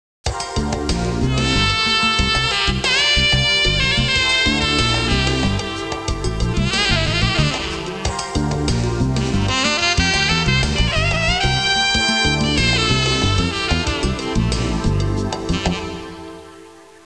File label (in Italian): musica urban